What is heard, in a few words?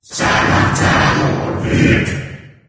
chant